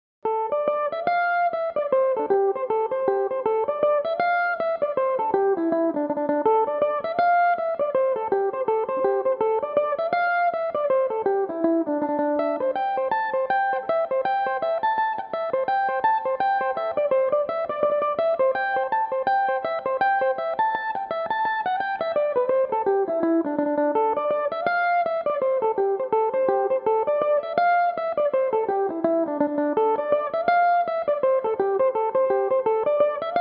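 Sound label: D dorian, Irish mandolin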